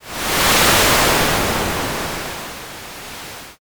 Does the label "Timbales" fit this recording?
No